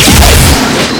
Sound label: Rocket Launcher